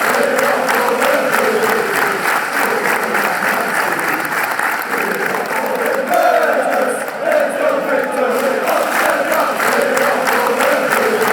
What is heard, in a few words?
chant; club brugge